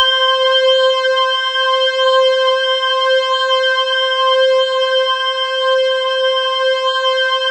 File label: C5, Synth Line; 90 s Sample CDs; NiceBeats; SynthLine VOL-30